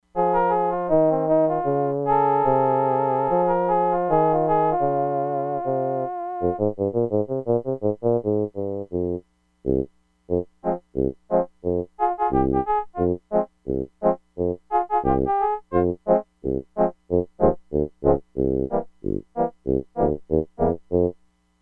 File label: DOUBLE REED, Crumar Bit 99; Factory Programs